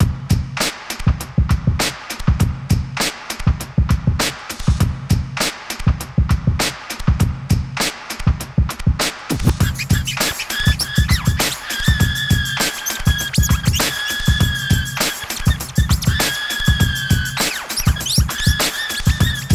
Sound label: 100bpm light staccato cello underscore